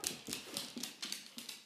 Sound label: Dog Walk